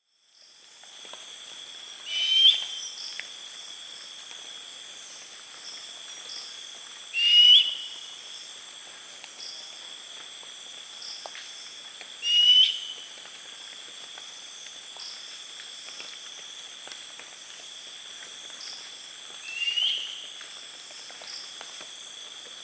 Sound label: Ketupa zeylonensis, Bruine visuil; uilen; Strigidae